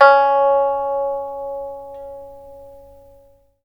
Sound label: PIPA C#5; Spectrasonics; Heart of Asia